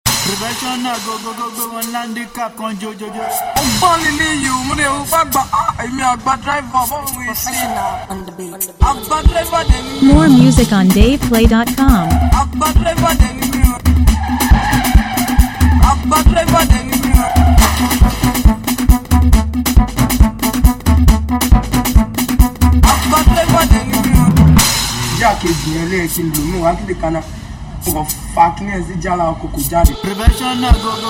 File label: Professional Beat